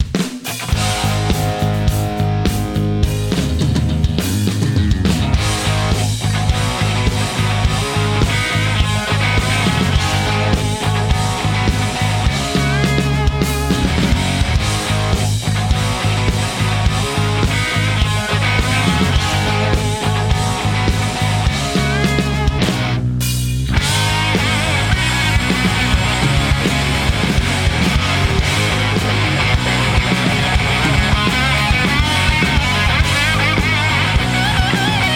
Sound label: Hard Hit (Instrumental); Rugby League Live; PS3; Xbox 360